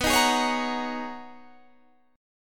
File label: G sharp Minor 11th B, guitar chord